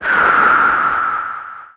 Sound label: Launch